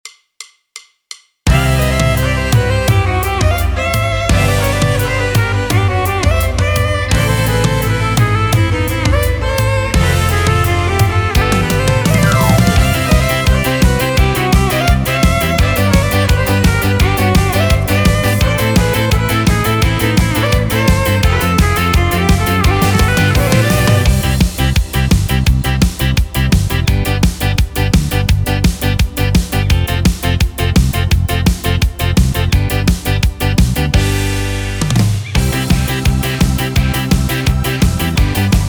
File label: Style Yamaha; yamaha psr sx720